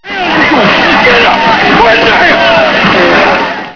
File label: Get Up Snd; Sir Richard The Lion Hearted